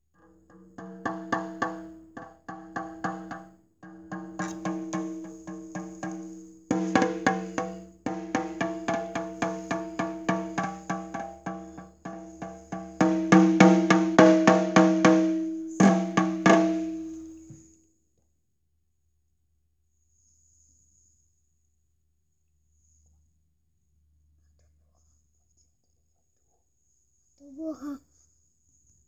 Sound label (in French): Vocal tambourin; vie de l'ecole